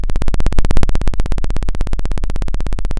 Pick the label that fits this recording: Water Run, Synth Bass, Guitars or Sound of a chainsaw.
Sound of a chainsaw